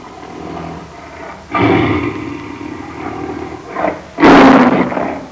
Roaring tiger

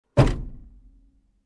Car closing trunk

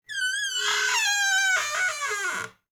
Creaking door short

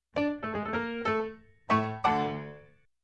Funny Piano Ending

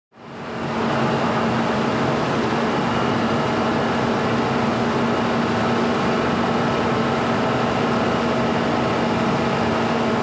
Construction

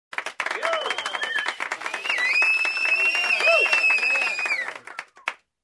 Group Applauding and Whistling

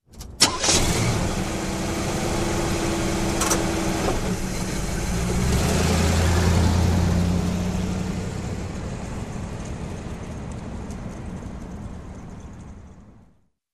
Truck engine ignition and drive away

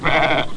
Single sheep bleat sound

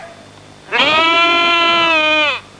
Loud goat bleat

